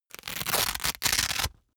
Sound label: boxing gloves open velcro; sport freizeit; boxen